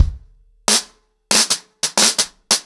90BPM, ACOUSTIC DRUMS; Sonokinetic - The Roots Drumloops

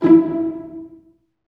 STR SEC, STRINGS; Roland - LA Composer vol. 1

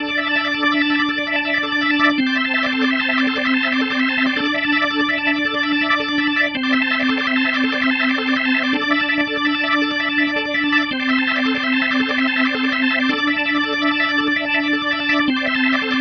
Psychedelic, Organ, Loops; Sonic Foundry (Sony Creative Software); Joe Vitale Organ Donor

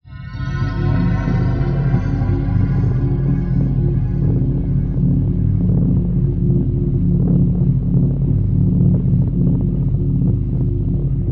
Dark Synth, cinematic drama soundbeds; musicradar

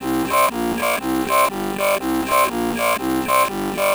C 121, synth loop; Acidized; Synth Loops; Zero-G; Soundclash Nu Dance Electro; 121bpm

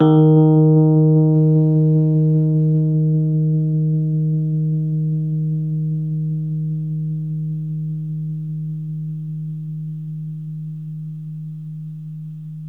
Rhodes 73 D#; E-MU Producer Series Vol. 5 – 3-D Audio Collection; 3DRhodes

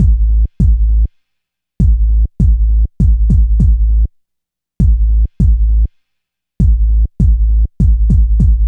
KICK LO, Laser Tag; E-MU Formula 4000 Series Vol. 1; Hip Hop Nation; Laser Tag 100

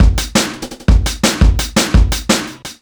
E Beat, Electronic Beats; Drum n Bass; Loops; Beats and Percussion; KJ Sawka; Drum n Bass & Beyond

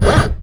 ENGINE, ROBOTS

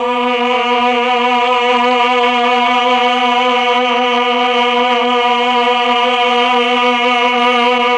CHOR; ILIO; Propeller Island Legendary M400

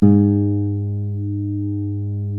A 2 MARTIN 6, Guitars

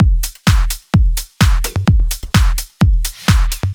Drumloops Beat 128 BPM Full; Vengeance - Essential Clubsounds Vol.5; VEC5 Drumloops; VEC5 Multi Loops 128BPM

